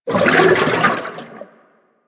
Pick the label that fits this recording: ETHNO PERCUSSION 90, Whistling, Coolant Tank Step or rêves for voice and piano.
Coolant Tank Step